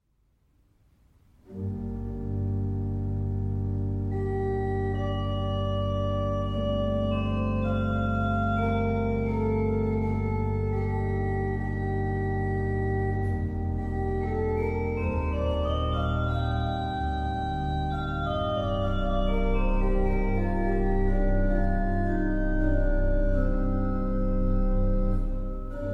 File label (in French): Interlude orgue